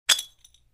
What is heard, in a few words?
Hand grenade; ضامن دار; نارنجک دستی